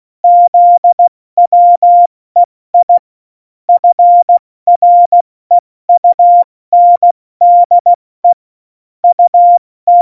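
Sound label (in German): Witz, cw morsen; Morsekurs; Witze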